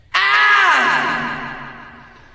aaaaah

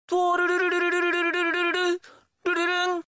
rurururu ringtone; doppios; jojo all star battle

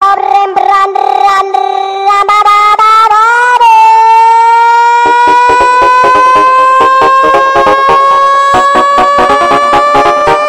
frog no bass

